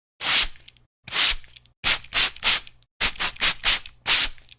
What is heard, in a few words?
spray bottle